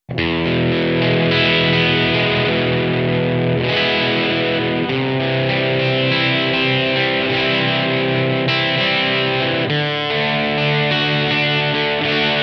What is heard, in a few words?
Maxon TBO9; BYPASS; No.3 Full Boost; G：Gibson Les Paul Std